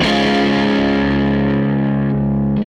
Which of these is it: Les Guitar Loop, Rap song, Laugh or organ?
Les Guitar Loop